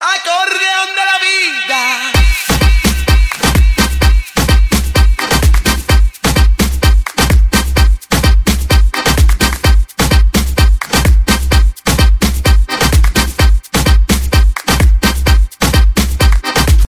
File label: acordeon, ringtones